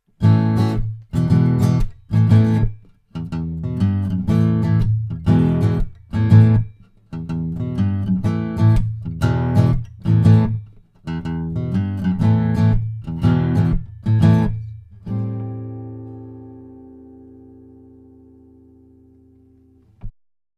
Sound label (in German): Akku mono denoise